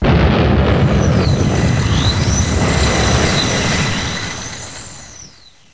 Avion; MOBICLIC 13

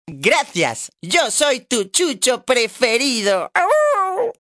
Clic C; MOBICLIC 65

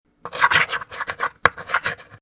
bruit; MOBICLIC 62; ZOOMZOOM

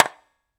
raquette; Ultranium 3D

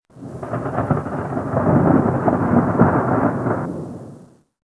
glace

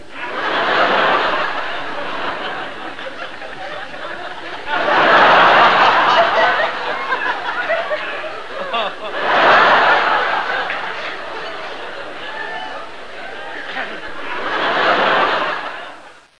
rire; iWave Interactive 01

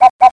Apparition

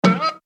saut

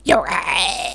couac

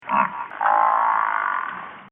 buffle; MOBICLIC 64

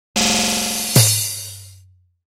bruit reggae; MOBICLIC 64

